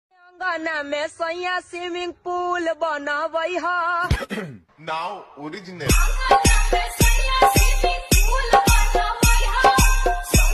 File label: Ringtone